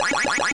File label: waza